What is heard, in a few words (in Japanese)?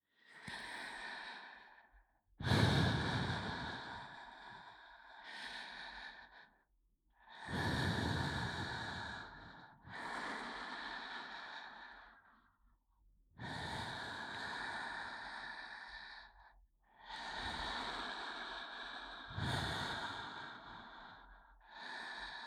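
吐息のみ 両耳, ASMR, 吐息, バイノーラル; しこしこオナニーサポート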